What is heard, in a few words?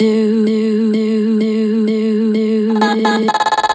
House Loops, Vocal Loops House 128BPM